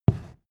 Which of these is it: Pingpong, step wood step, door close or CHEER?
step wood step